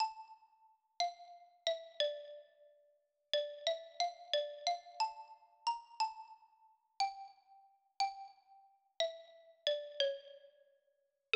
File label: Waltz